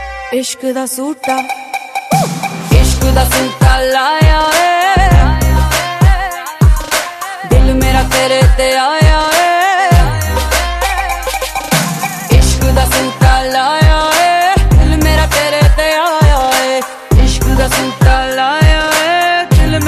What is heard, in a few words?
Romantic Punjabi Ringtone